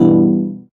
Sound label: Synth Prism Emin